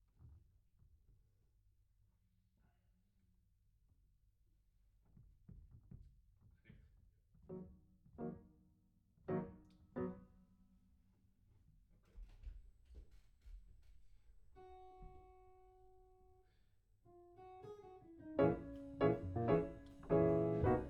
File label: Piano